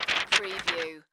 Paper Flap Sheet

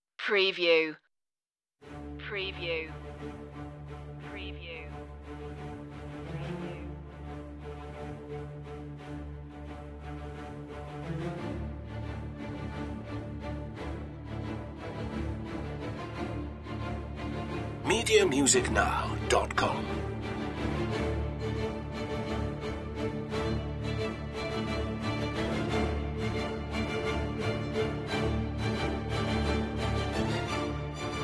strings

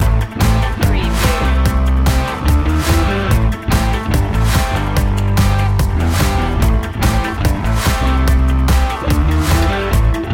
positive force loop

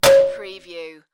Metal Short Impact Ringing

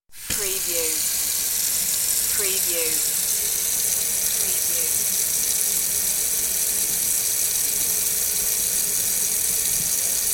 Kitchen Sink

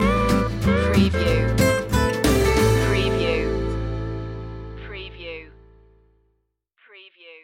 blues stinger

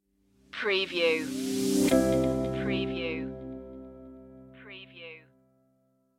new point of view stinger

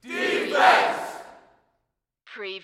Sports Crowd Chant Defense Single

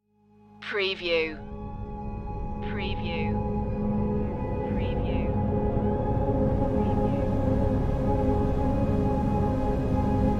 resolved ending wet

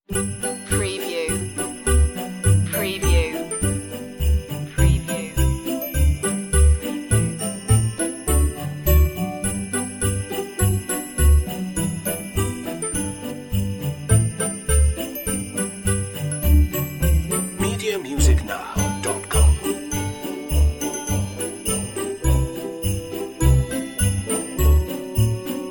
jingle bells traditional

